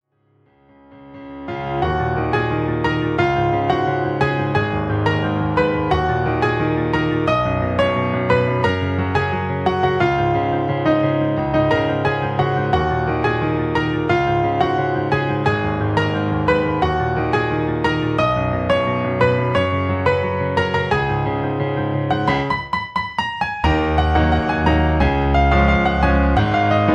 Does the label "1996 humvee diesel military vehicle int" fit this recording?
No